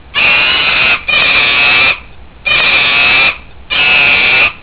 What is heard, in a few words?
monkey sounds; primate